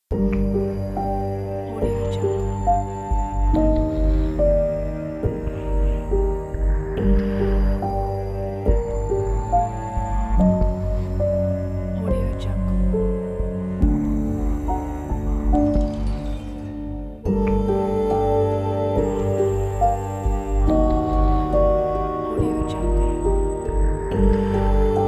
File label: dark time